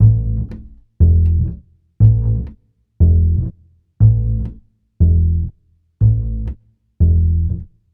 Upright Bass, Ukraine Gypsy

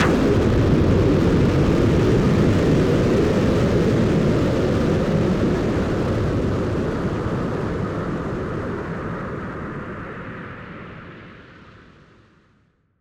Noise Bomb Boom Filter; musicradar; bomb factory

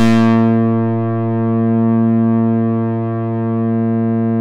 EMU MODULAR, modular syn; Sonic Reality; Vintage Timetraveler

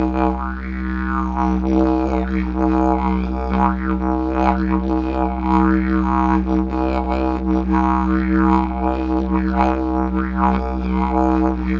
Digiridoo

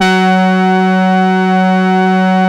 700 BRS F#3, Korg; Back In Time Records; Korg Universe VOL-1

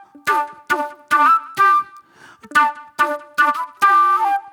Agro Fusion Flute

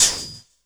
PERC, 170 BPM; Ueberschall; Drum & Bass Resonance

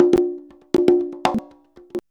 CONGA 119

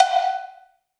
Perc, Gated Reverb; musicradar

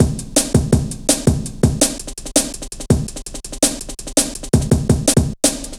Ruffneck, Drum Loops 165.5; Zero-G Jungle Warfare 1, 2 & 3

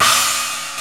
CHINA CYMBAL; Crypton - DrumBase5000

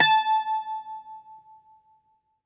Greek Bazouki, plucked strings; E-MU Producer Series Vol. 7 – Old World Instruments

